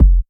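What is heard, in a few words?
Bass drum 909er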